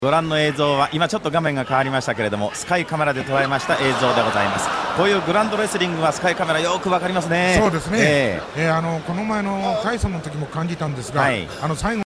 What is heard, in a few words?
laughter; tom magee